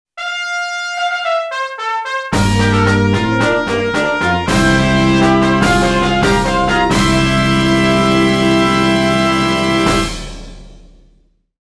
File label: fanfare; Final Furlong